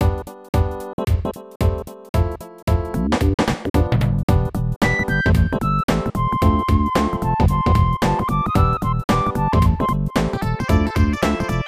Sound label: Grand Slam Achievement; Super Family Tennis; SNES